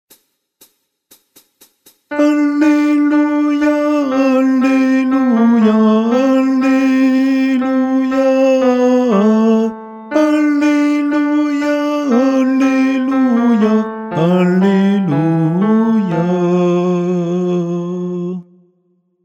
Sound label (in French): Alleluia Guide Chant Tenor